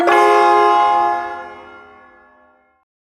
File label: Ersatz Shawzin Phrygian Chord G; Warframe; Duviri Ersatz Shawzin; Chords